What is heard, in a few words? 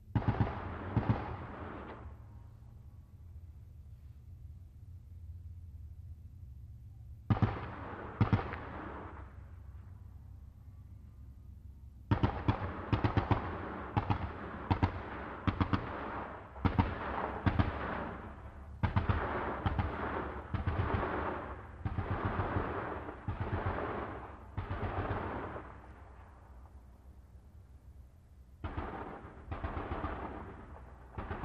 .50 Caliber: Firing, Distant.